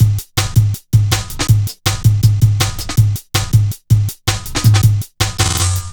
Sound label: Drum Loops, Jungle